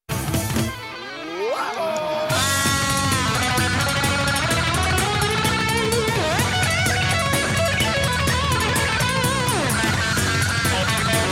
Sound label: solo; GT8